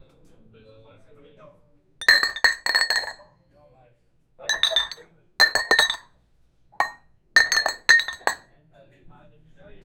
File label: the clinking of glasses